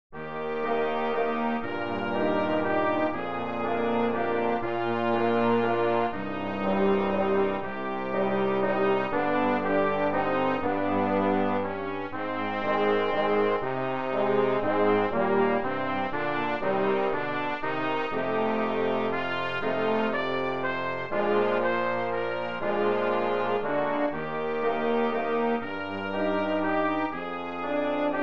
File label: quintet